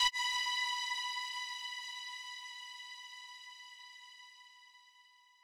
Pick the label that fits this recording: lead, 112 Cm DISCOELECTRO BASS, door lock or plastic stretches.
lead